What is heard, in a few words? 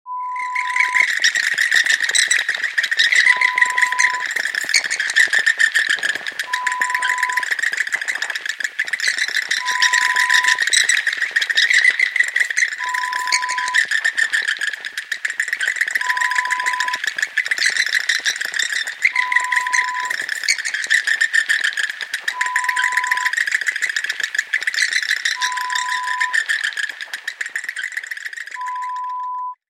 Scuttling Rats; Horror Sound Effect; Lo-Fi